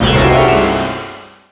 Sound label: accomplish; MOTS